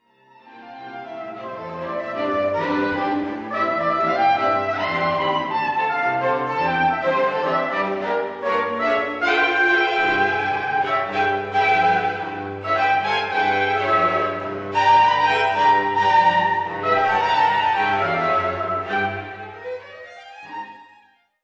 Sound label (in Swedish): Jazzsvit